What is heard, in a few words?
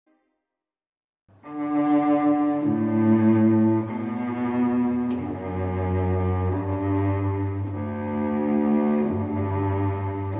Canon